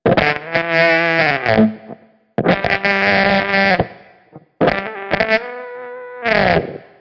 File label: dying amp ascending, Guitar Noizes